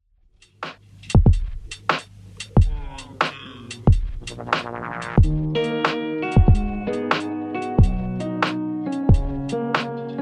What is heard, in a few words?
Beat